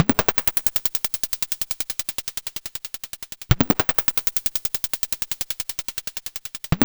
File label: pitta patta, analog loops; Zero-G Ambient 1